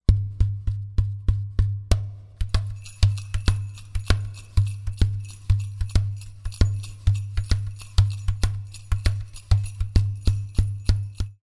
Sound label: mbira gourd talk